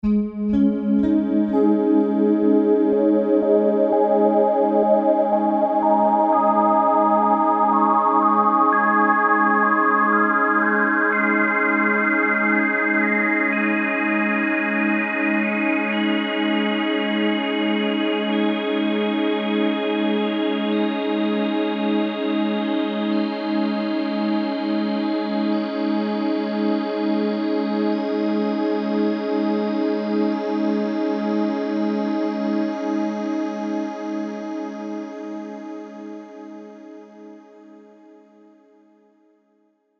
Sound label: Wavestate, synthesizer